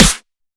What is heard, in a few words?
Snare; Percussion